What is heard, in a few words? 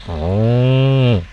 moo